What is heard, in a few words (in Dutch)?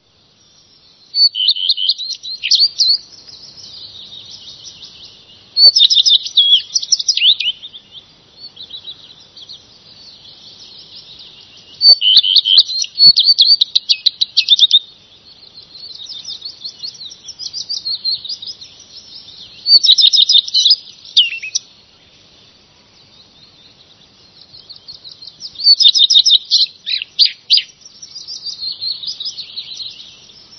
roodstaart